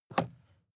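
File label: sports car interior pedal clutch release; verkehr transport; autos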